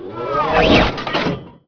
projector deploy; Emperor - Battle for Dune

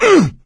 hurt major, survivor voice; Left 4 Dead 2; coach

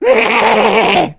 jockey spot prey; voice; idle; Left 4 Dead 2

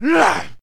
man ugh; Mount&Blade Warband

